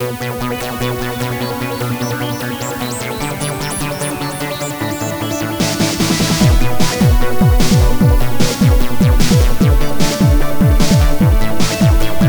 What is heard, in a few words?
jingle; congress radio